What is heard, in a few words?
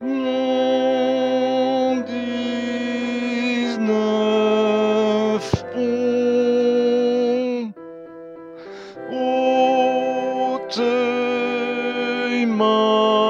Soprani Amour en 19 ponts